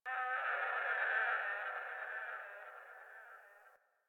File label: Landscape Distant Creatures Low Elevation, Ambience; Warframe; Venus Open World; One Offs